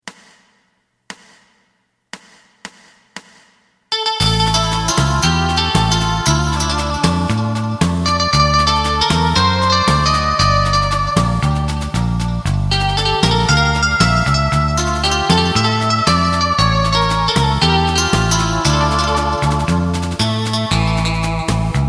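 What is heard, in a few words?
side guitar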